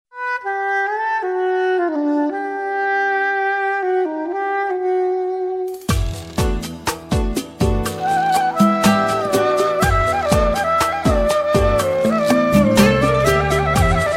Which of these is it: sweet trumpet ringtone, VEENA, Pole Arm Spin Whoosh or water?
sweet trumpet ringtone